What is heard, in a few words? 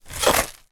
food stackable potato chips in tube shake single crumbs; pringles